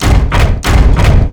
Run; ArkAstanica; Gamigo

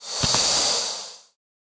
Ghost Walk

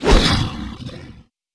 Leopard skill; Seer; GamigoNA

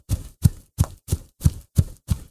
Fire Cat Run; Seer; GamigoNA

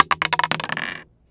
Dice Roll Single; Seer; GamigoNA

